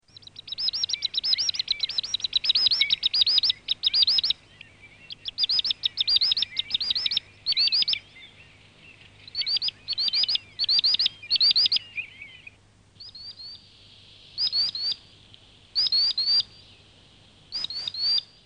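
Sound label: Common sandpiper; Madarak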